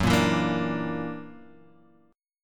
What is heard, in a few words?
F sharp Augmented 7th, Guitar Standard; strummed; 2,1,2,3,3,x; JGuitar